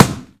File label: boxing gloves hit; tf